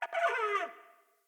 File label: Trapped Fawn Call; Encounters; Animal Rescue